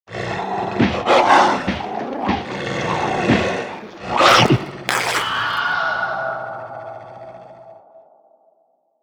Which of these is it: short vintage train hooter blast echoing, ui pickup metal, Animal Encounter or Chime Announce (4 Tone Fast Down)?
Animal Encounter